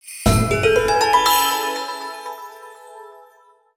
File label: Collect Tear Vessel; the legend of zelda skyward sword wii gamerip 2011